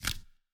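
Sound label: Shotgun Reload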